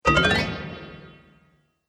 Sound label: Metal Magic Up; Magic Sound Effects